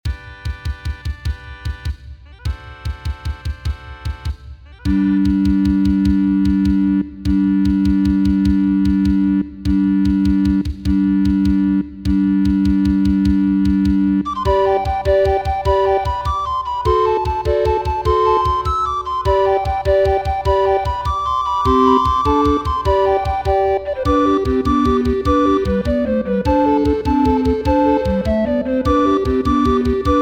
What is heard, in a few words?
Irish Washerwoman no Alto